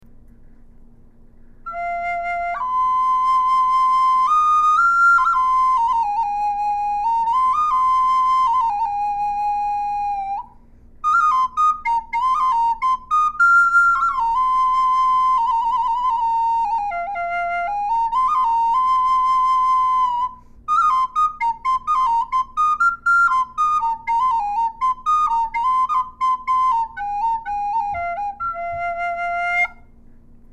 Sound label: High F Poplar, flutes